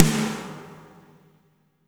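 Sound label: reverb snare right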